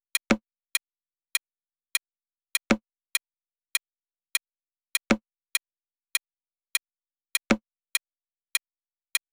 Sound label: Drum Loop - 100 BPM Percussion, Latin; Loop Stems; Cymatics; PARADOX II - Drum Loop Collection; Flame